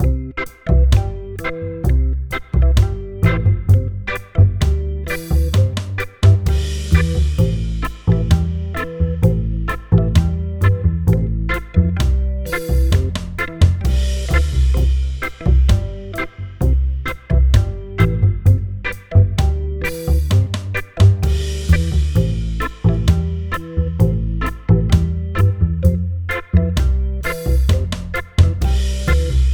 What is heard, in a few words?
example reggae; lesson 9